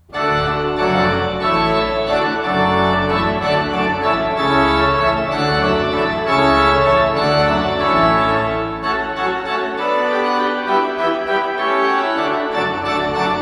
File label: Hornpipe; Water Music; Handel